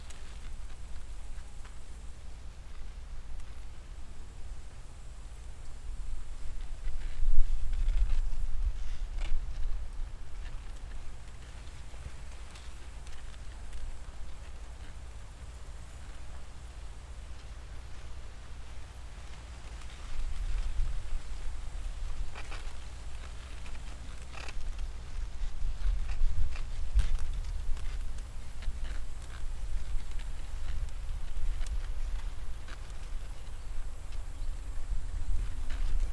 Wind in cornfield

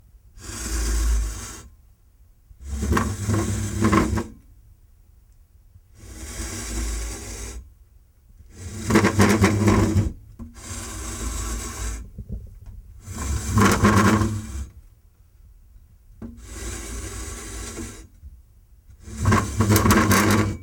Metal Chair On Linoleum

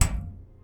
Metal Hit

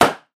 Bang Clean

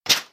Tentacle snap

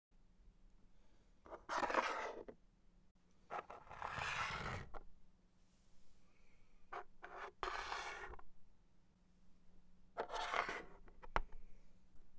Dragging Chess Pieces On The Chess Board

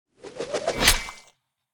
Axe Throwing Hitting Flesh

